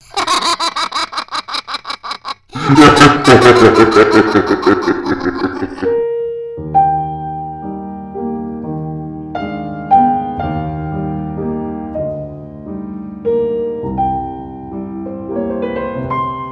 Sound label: laugh